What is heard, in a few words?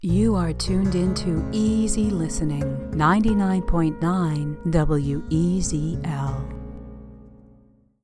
Easy listening